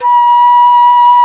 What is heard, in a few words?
shakuhachi A#3; InVision Interactive; Lightware VOL-1; Instruments & Percussions